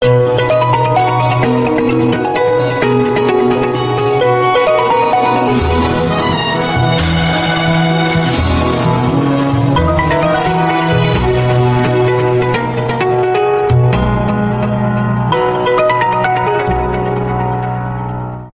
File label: samsung remix